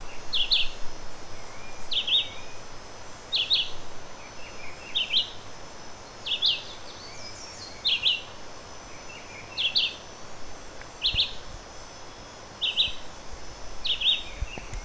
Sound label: Vireo chivi Juruviara